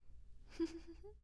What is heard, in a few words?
Woman laugh